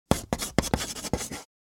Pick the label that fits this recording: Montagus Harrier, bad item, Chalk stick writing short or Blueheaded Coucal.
Chalk stick writing short